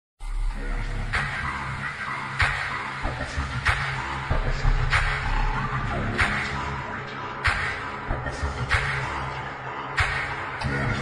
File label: attitude ringtone bgm ringtone english ringtone